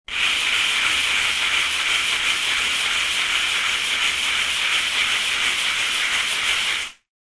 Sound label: brushing